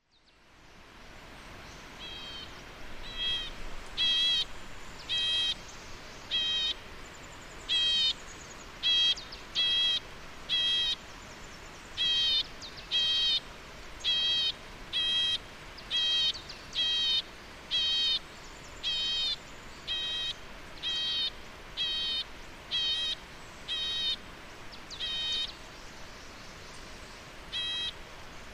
White cheeked Nuthatch Sitta leucopsis, white cheeked nuthatch call